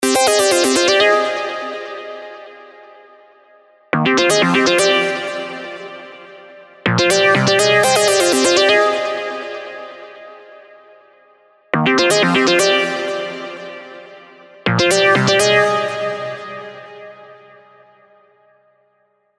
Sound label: electric heart, diva melodic leads; revealed diva melodic leads vol 2